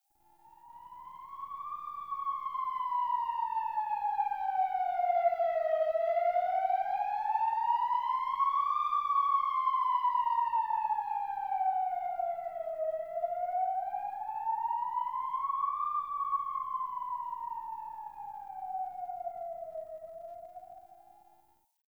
Exterior Distant Ambient Siren H; Warframe; Ambience; NineteenNinetyNine

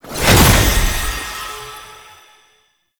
Companion Resurrect; Warframe; Tenno

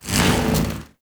Venus Hoverboard Slide Start, Vehicles; Warframe

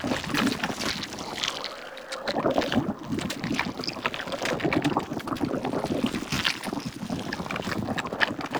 Infested Destructable Cyst Loop; Ambience; Props; Warframe; Infested Eris

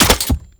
Shot Mech, Weapons; Warframe; Cedo Prime

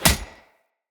Hunter Bow Arrow Impact Armour; Weapon Impacts; Bows; Envoy; Combat

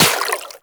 Water Sprayer Beam Impact Flesh; Warframe; Weapons

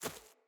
Battle Dog Walk Veg, Footsteps; Snc Battle Dog; Foley

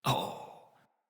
Death, Battle Barks; Envoy

